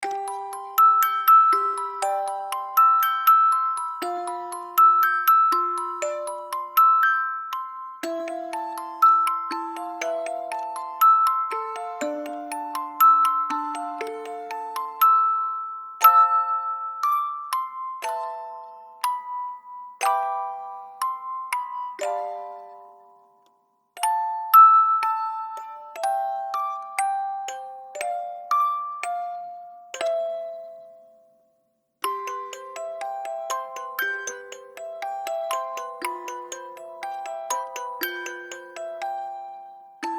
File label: Enchanted Entrance